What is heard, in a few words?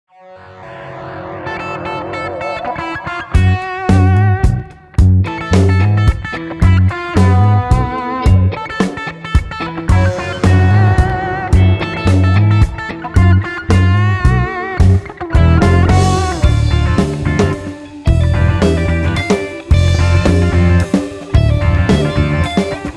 Caveman Waltz